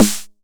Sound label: 909 SD; Goldbaby Tape808 & Tape909; The Tape 909